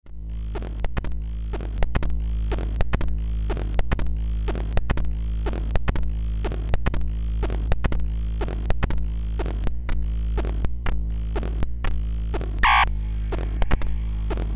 Landscape Noon Drum Sequenz B

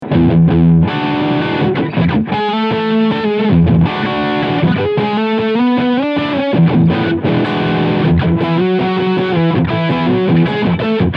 Ibanez AZ 2204 Riff Sound Steg Pickup

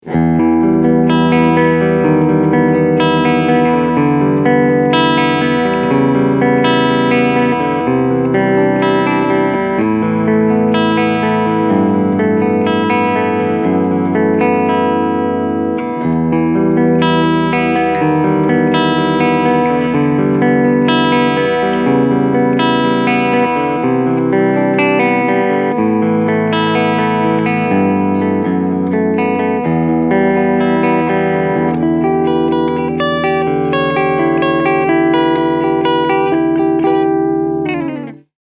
Clean beide Voicing B

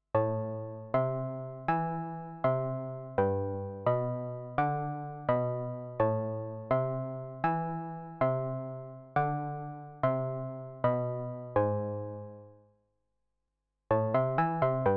MOOG MATRIARCH Sequenzer Aufnahme

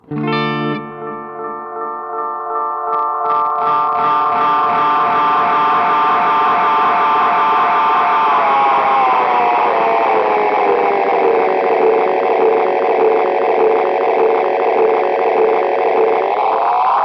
Echosex 3 Oszillation; Gurus

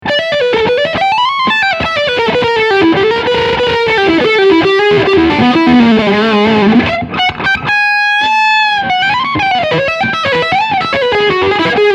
Leadsound Steg PU

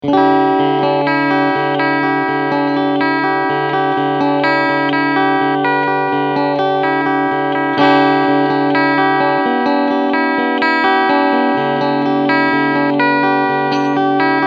Steg Mitte Clean

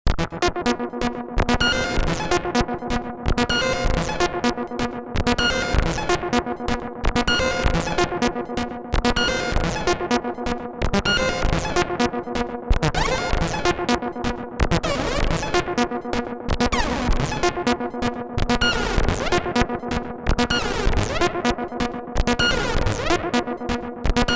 Sequenz; Treadstone

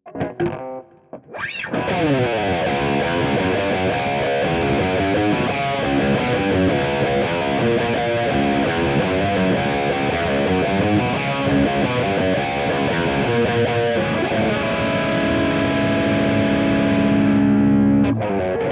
Drop C Riffing und Clean Up, Schecter SLS Elite PT

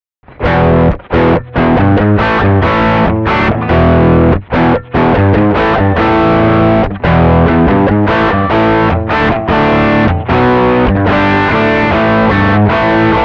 ohne boost; recout

